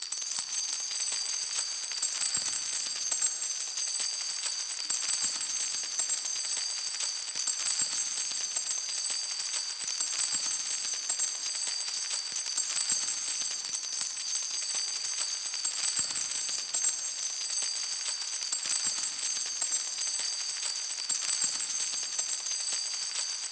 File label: coins Particles; netent